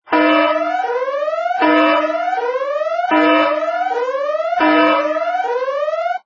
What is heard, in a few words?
boss Bgm